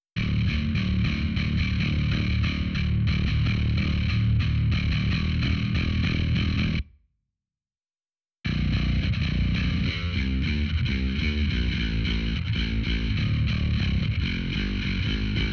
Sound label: bass